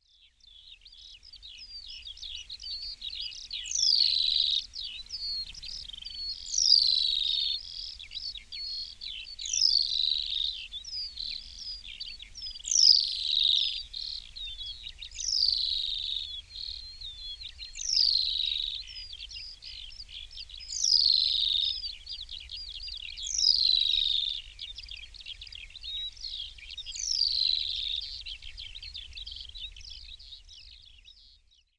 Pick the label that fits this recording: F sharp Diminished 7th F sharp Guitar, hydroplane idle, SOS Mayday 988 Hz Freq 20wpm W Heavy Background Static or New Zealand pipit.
New Zealand pipit